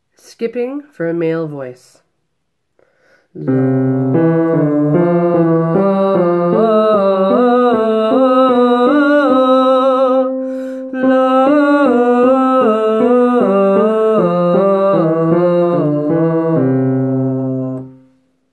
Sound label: male voice skipping